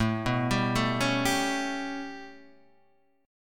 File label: B 7th Suspended 2nd Sharp 5th A